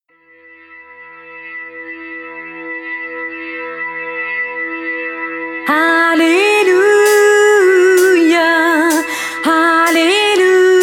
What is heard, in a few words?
Halleluja; herz